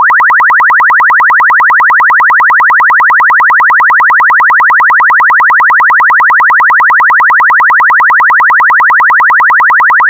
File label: chirp; chirp toys